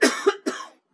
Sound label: cough